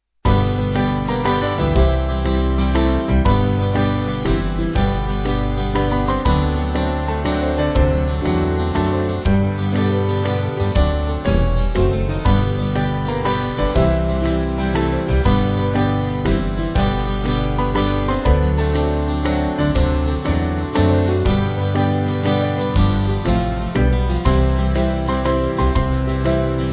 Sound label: Band